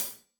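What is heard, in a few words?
hat closed, hi-hat cymbals stereo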